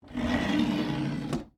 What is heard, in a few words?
latch locker cable steel wood room channel slide catch; Sonniss GDC